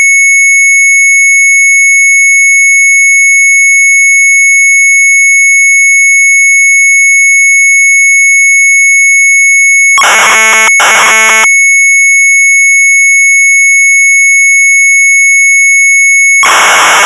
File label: MZ800 Tape, sharp; REVOLVERHELDEN; retromelsarchive